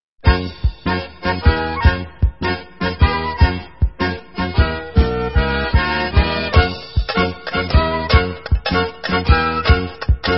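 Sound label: Hora Instrumental, Israeli Dances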